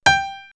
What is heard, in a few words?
House Piano; X-Static Goldmine; backtothe70's